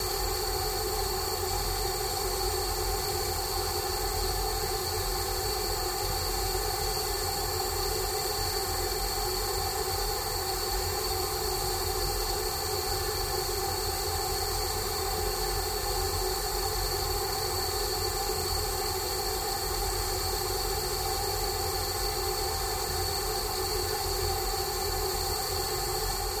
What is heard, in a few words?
Gas Furnace Constant More Hiss